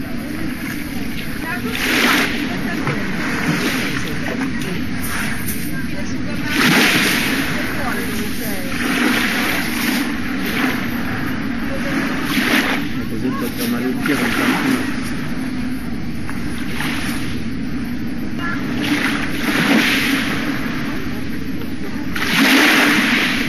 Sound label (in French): Mer cirque ete voix tres calme vaguelettes